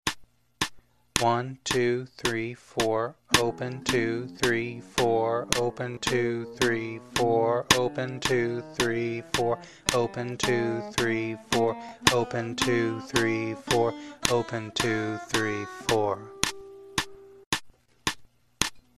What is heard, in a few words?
fretting 110, guitar, Here it is at 110BPM